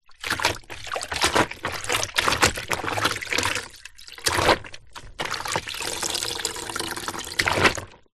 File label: household mop in plastic bucket shallow water movements dips pull out drips